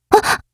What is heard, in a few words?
m4a1 jump